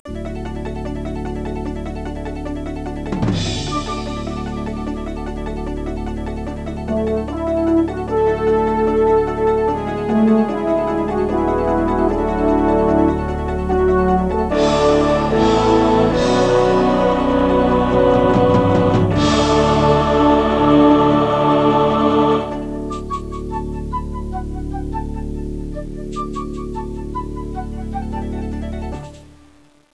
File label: hip music; Pixie Dust